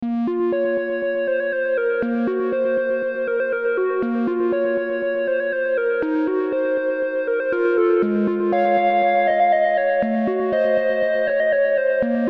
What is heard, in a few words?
nightly melody